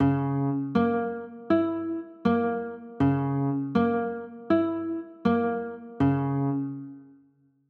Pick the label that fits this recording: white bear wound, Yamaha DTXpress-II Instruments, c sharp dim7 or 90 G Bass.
c sharp dim7